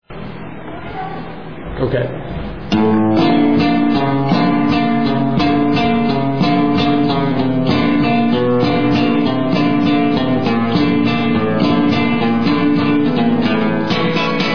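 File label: Bulerias, flamenco guitar; SEVILLA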